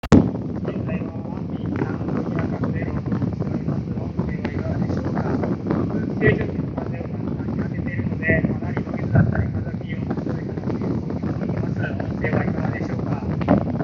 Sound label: wind